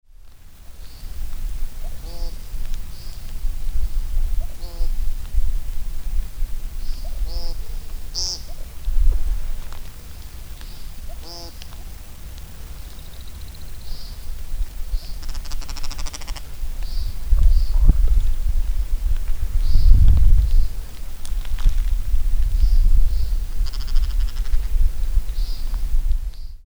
American Woodcock

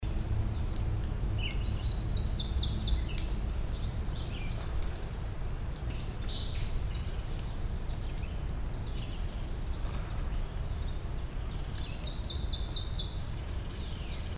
Prothonotary Warbler; Carroll MD

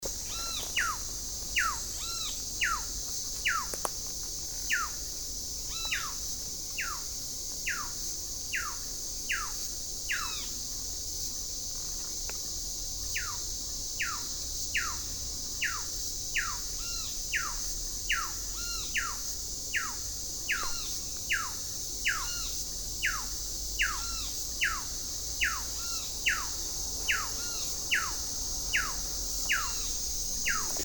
Rosy Thrush-Tanager, Panama

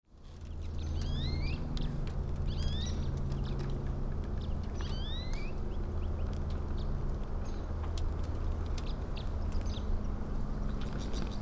Red Crossbill Type 3; Taylors MD

